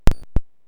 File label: Gameboy Noise